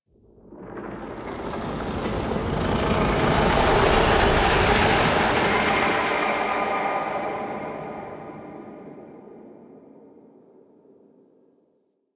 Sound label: Pulsating Whoosh; Ambience